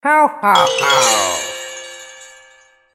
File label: Jingles; Headbangers in Holiday Hell; ps4; windows; xbox one; xbox series xs